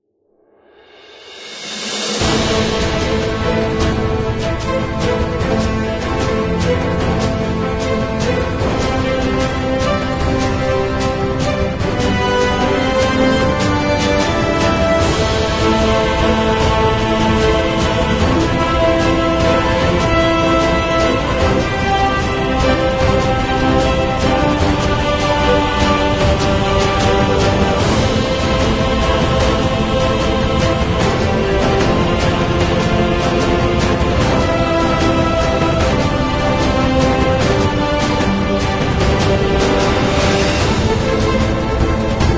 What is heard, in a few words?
Epic